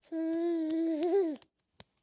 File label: clap, baby; CosmicRage; CosmicRageSounds